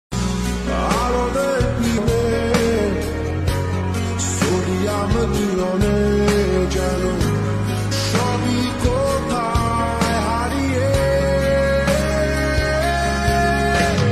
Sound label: Bengali Ringtone